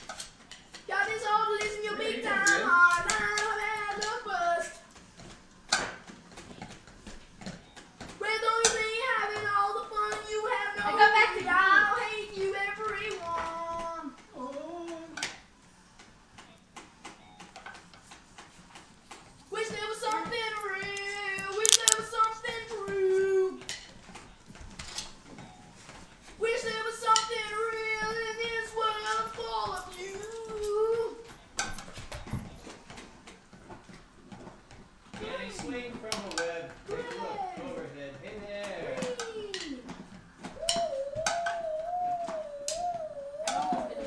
singing

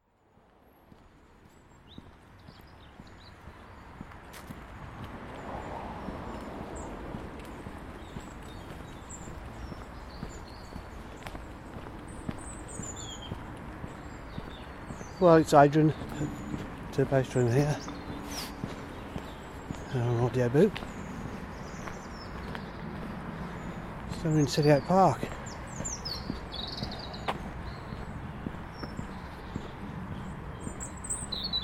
soundscape Walking in Selly Oak Park

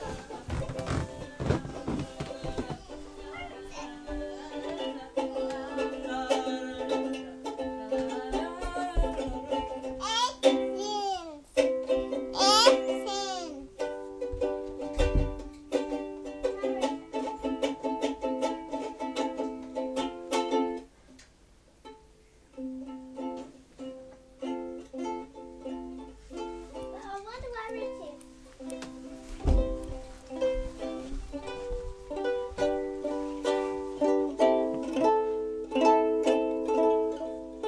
ukulele

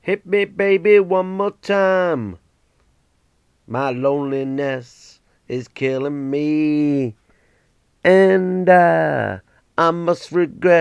singing baby

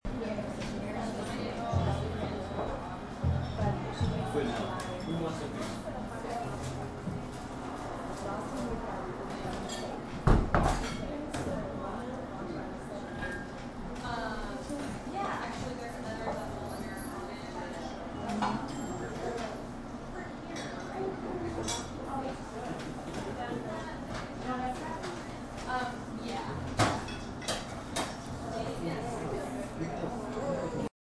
sunday cafe ambience

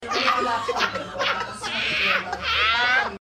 cackle; hebburn

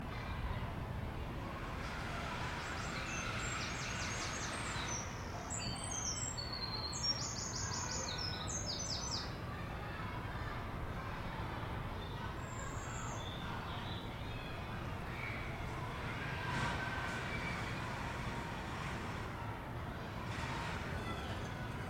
birdsong and other garden sounds; recorded before the summer holidays

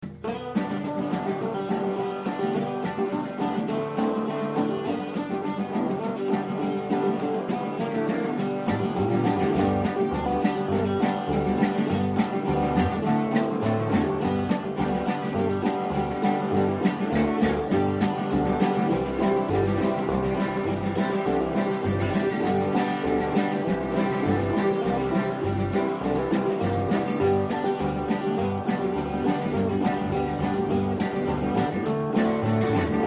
electric little ditty

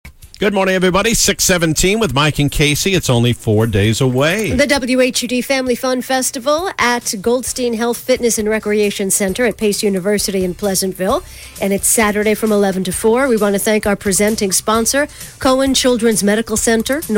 acapella group

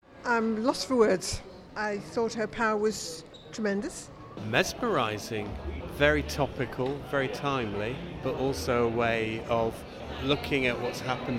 audience reactions

